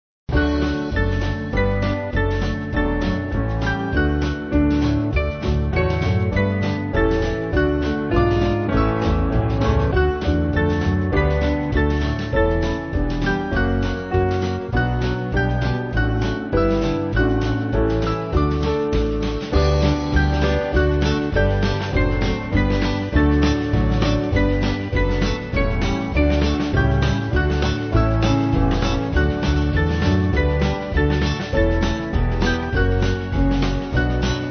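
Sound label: Band, Music Backing; Hollingside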